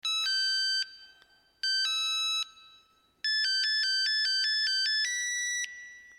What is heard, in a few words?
Bumer Midi